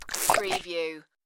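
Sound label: Throat Slit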